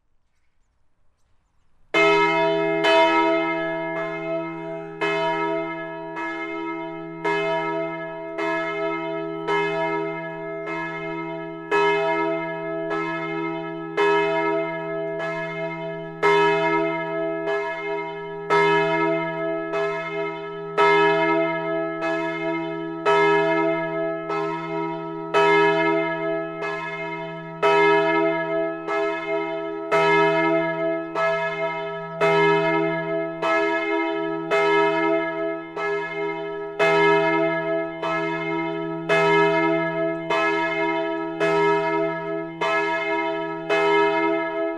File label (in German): Glocke E; St. Nikolaus